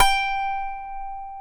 G4 DULCIMER; AKAI S6000 CD-ROM; WorldSounds

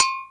Korg N5 Rock Kit

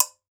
GANKOKWE, African Perc; E-MU Producer Series Vol. 7 – Old World Instruments

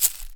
caxixi; Brazil

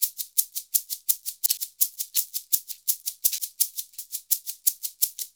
SHAKER, Modern Percussion Loops

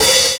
Atria HiHat; Sonic Foundry (Sony Creative Software); Drums & Drones; Construction Kits

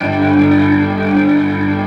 G1, Keyboards Old School; USB Soundscan vol.09; AKAI; BIG HAMM

